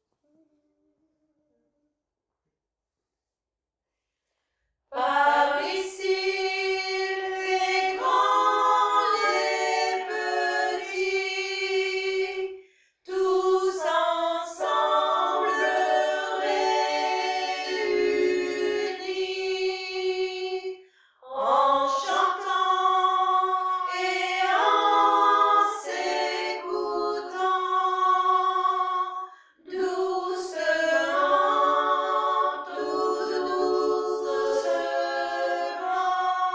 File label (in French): Temps calme à 3 voix